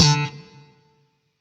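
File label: Sentient Shawzin Slap Octave Three D; Chords; Warframe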